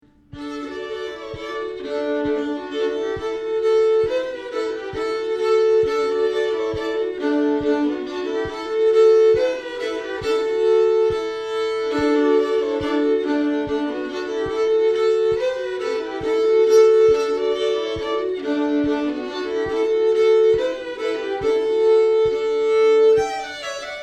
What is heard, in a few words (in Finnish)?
polska duet; Rahapolska